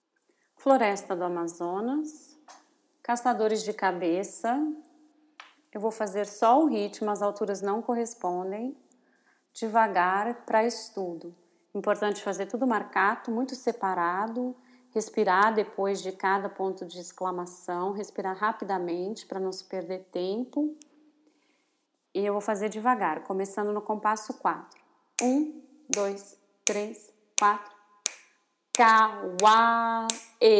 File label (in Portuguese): ritmo estudo; praticacoro; Floresta Cacadores de cabeca